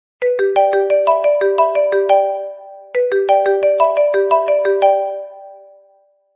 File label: gujarati piano instrumental